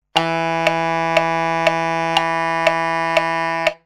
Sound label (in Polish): dziura db1, saksofon